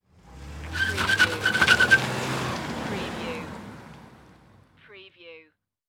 Car Tires Screech

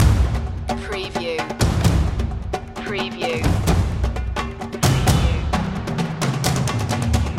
storming percussion loop

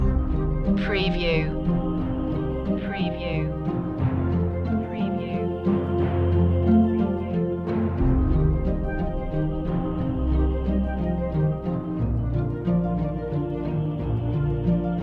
magical fantasy loop

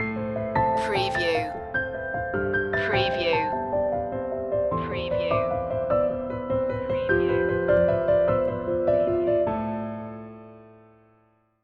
love and heartbeats stinger

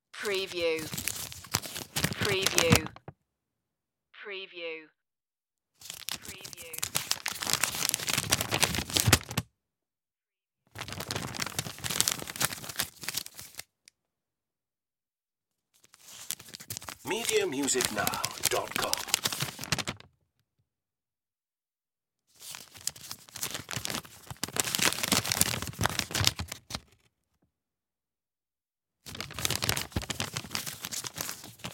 unfold paper leaflet

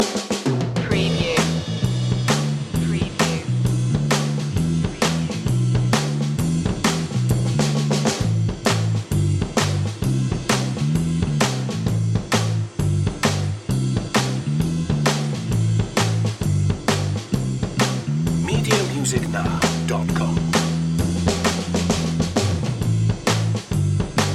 bass drums

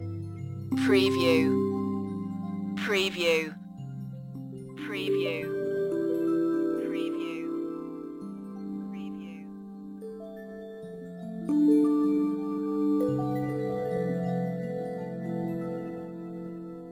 dream bell loop

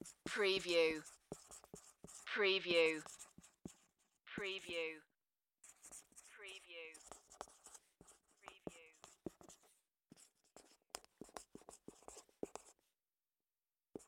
Dry Erase Marker Write; Sound Effects Bible

